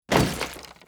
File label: environment zone barricade break